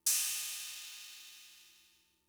Cymbal, Fricke MFB-522, Drum Machines

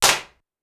Clap, Pitched Up; Clavia DDrum-AT

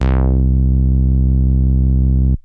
Bass Synths hits and octaves

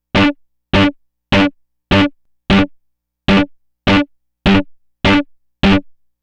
Disto Guitar, Guitar Synths; Dr. Fink's Funk Factory

